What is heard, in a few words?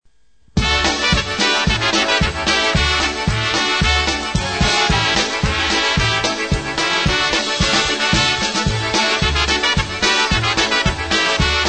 POLKA